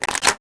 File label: Reload Pump shotgun; weapons; Max Payne